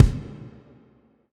kick

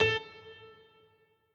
piano riff intro

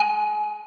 Arp; osu

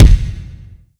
Drum Hit Beat Long

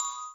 Bells

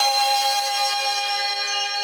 synth main

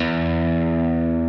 Arp.

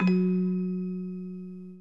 orgel

